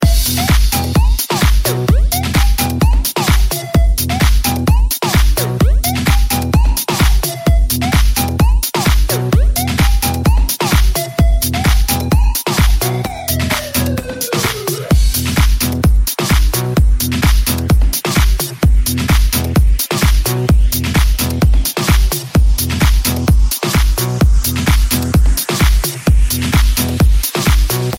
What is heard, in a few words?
House Electro